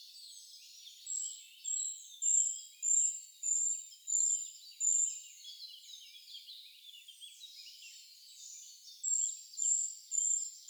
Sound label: Eurasian Treecreeper, Waldbaumläufer